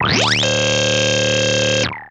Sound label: FX, attacks; Best Service Advanced Media Trax Modern Composer